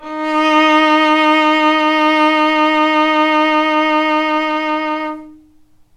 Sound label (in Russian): skripka arko vibrato odinochnii d 3